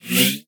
Swipe Right; Pitch Black Audio Pong

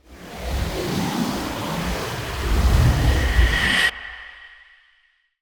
Ice Spell Summoning Calling Ice Spirits; Arctic Aura

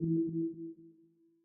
Hit; Pitch Black - A Detective's Demise

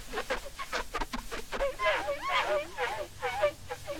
Squeak Polish Statue; SFX Kit

